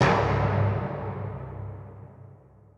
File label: timpani